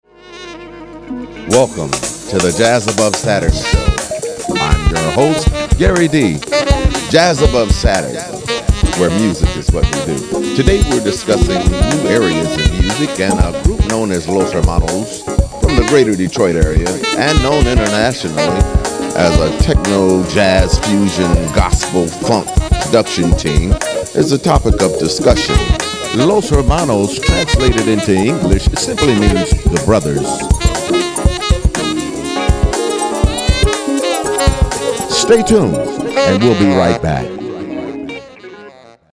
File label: Jazz